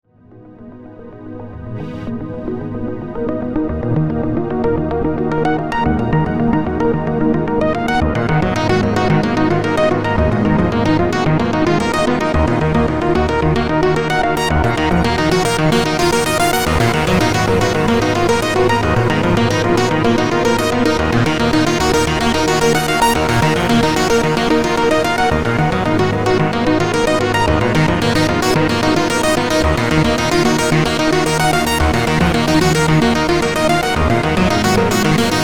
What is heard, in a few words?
Prophet 5 Arps DRY